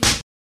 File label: whip